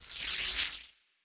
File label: lightning muzzle electrical sparks, weapons; kaiju; Stellaris; biogenesis